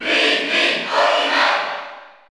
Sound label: Cheer Spanish PAL; Olimar; SSBU